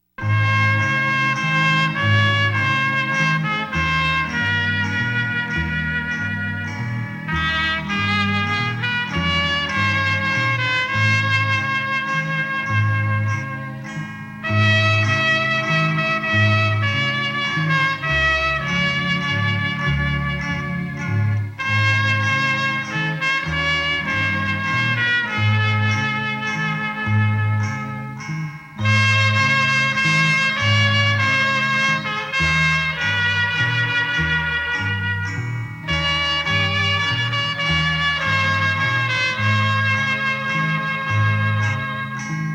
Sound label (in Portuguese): Vem Espirito Santo (Instrumental)